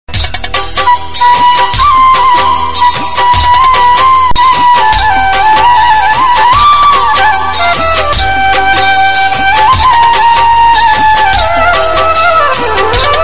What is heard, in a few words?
Basuri Love